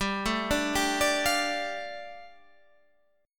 G 7th Suspended 2nd G; x,22,19,19,19,21,19,x; down

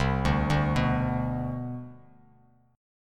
C Major 7th Suspended 2nd, C, down, Bass Standard; arpeggiated; CM7sus2

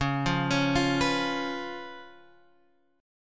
Db 7th Db down Guitar Open G

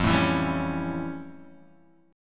F Augmented Major 9th Guitar Open D; 3,0,2,1,4,x

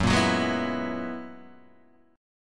Gb 11th, Guitar, Open D

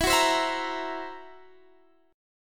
Fsharp 7th Flat 5th, guitar chord

